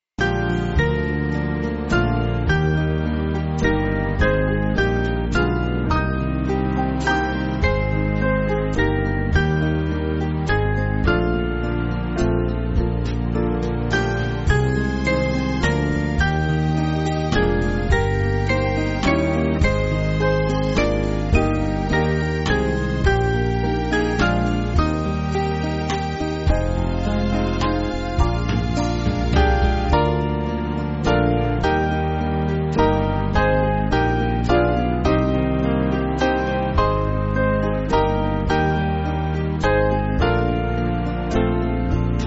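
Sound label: Band, Music Backing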